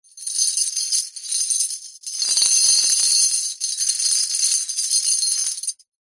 industrial chain small movements drag lay down concrete